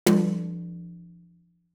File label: ambient